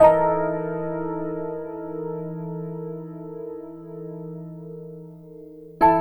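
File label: Acoustic Piano; Keys; Keyboards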